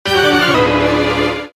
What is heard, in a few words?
death